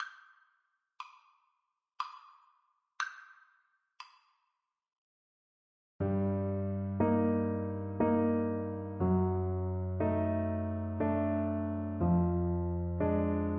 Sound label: waltz, flute